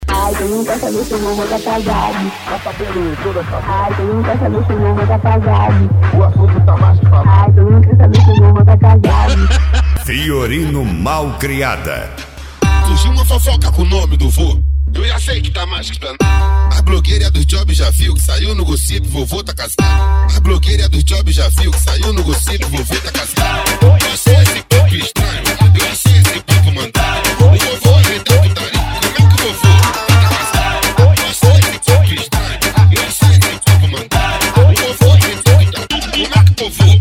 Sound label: mega funk bass